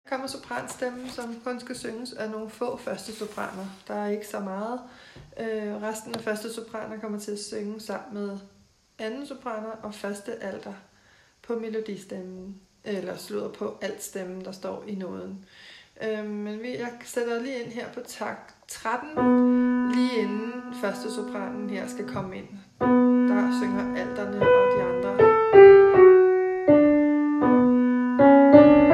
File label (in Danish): sopran; synges af de 6 yderste 1. sopraner